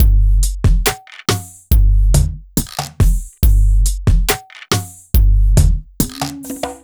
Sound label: Slomo Beat 140 BPM; Loops; vengeance essential club sounds-3; Slow Motion Beats